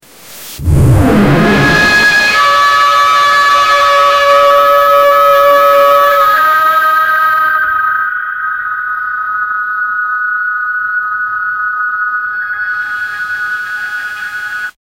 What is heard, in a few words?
the sound of taking out, anime